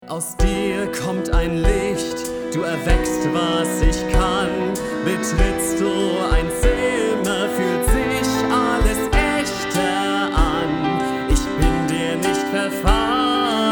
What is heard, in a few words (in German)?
Gesang